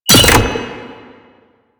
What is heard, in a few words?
Grenade Bounce; Lasrian Goonadier Grenade; Lasrian Soldiers; Weapons